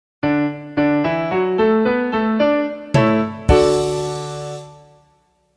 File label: Establish D Major; McGraw-Hill; Establish a Key